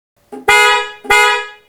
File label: sports horn